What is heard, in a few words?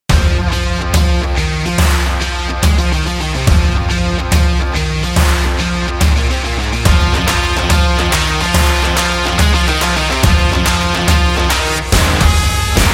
Rock Promo